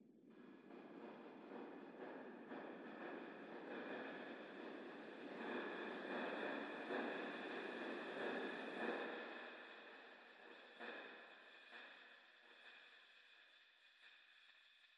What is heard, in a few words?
Noises